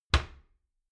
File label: door wood close heavy